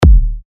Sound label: D#, techno kicks; Uptown; Revealed Techno Kicks Vol. 10